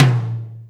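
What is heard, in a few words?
Tom Md Power, Alesis D4 drum machine; 300 Drum Machines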